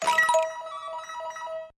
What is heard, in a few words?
call in